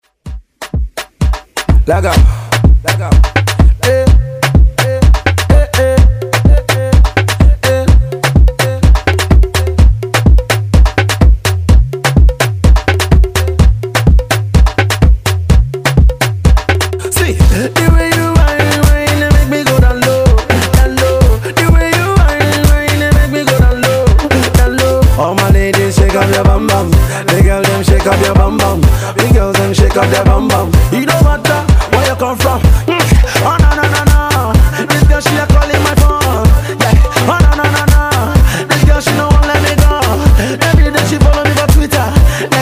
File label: Afrobeat Redrum